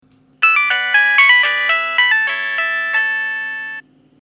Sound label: Nokia Tune